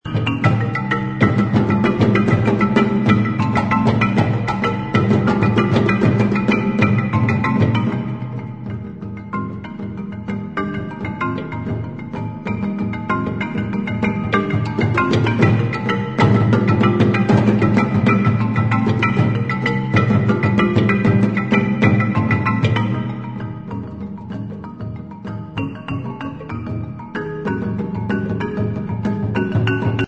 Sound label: ghanaia drum together, percussion